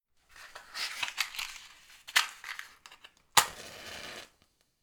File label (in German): Streichholz Schachtel; Geraeuschesammlung